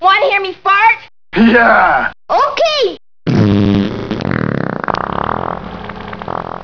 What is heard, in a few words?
fart